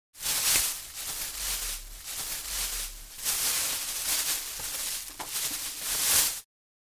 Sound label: Wrapping an object with plastic